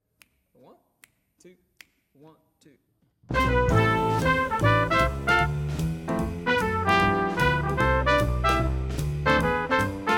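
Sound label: Jazz Trumpet; 2023 All State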